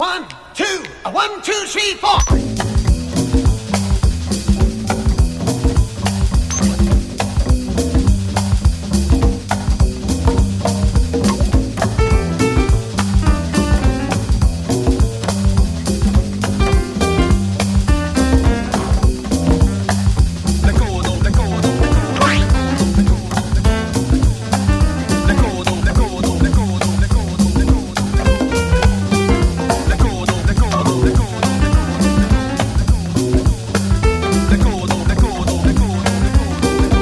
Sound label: REMIX INSTRUMENTAL